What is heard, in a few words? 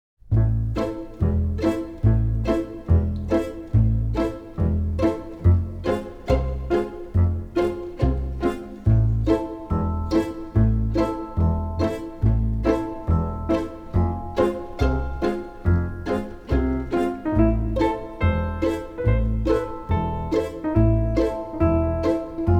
Pizzicato Caper; Being Eileen